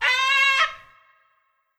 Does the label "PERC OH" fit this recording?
No